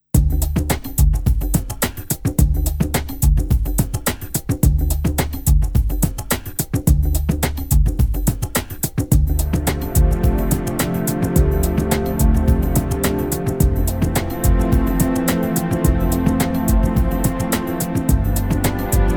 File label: Chilled Ambient